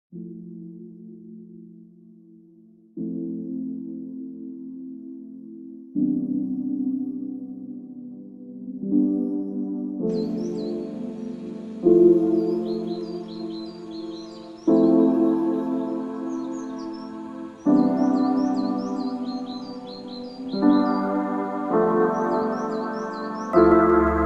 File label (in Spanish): piano ft canto de aves, musica positiva ambiental inspiradora y relajante; Dueto de piano y aves, 15 minutos de música positiva, ambiental, inspiradora y relajante